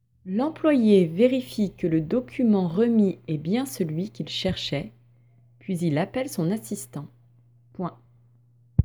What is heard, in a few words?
Vocal